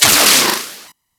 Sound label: Split Laser Beams Join; Warframe; Corpus